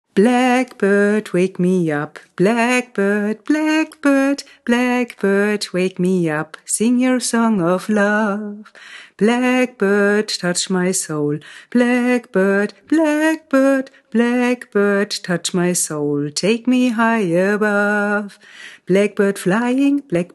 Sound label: Blackbird